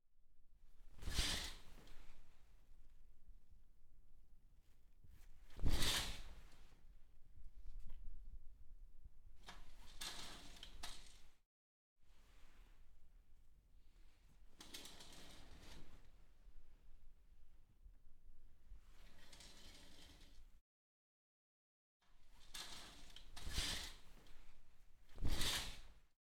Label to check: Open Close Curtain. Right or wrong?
Right